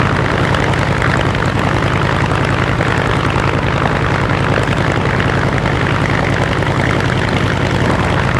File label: zero rear, engines; War Thunder